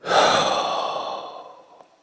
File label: Exhale Echo Delay